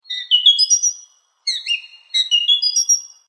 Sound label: bird chirp notification sound ringtone